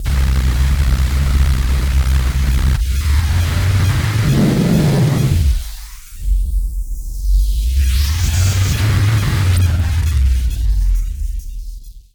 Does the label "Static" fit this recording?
Yes